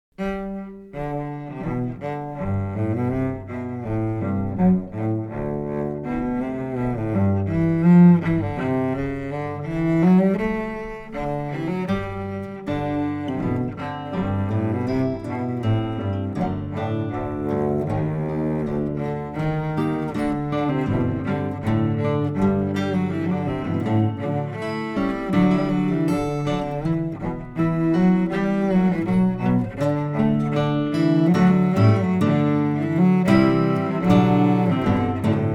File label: guitar and small elephant